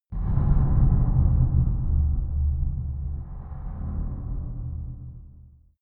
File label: far dark metal rumble, hits impacts